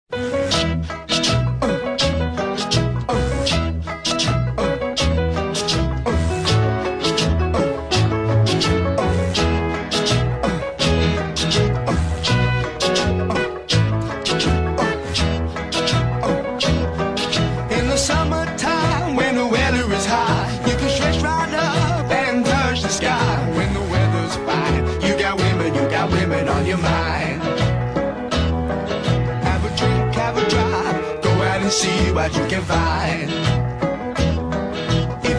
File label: 60s music